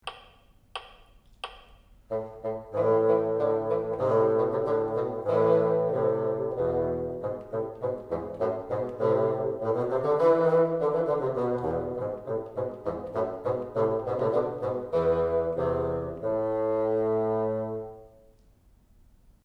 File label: together, bassoon